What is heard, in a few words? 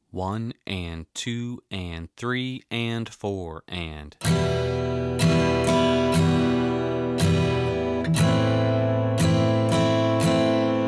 A Minor Chord